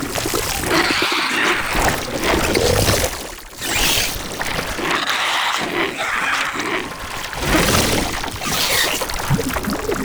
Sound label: Gestating Tumors Loop; Gameplay; Warframe; Nineteeen Ninety Nine; Deep Archimedia